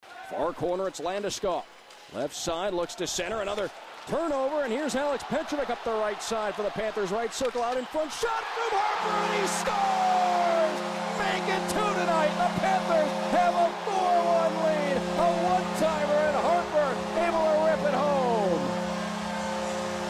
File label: goal 3rd period; everett silvertips; Harper goal 4-1 FLA 3rd period